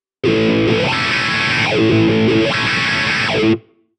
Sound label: wah